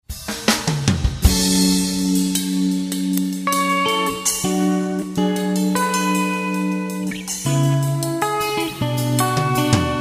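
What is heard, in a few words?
Clean OD100, guitare, Fryette Amplification Two Fifty Two; Al Estrada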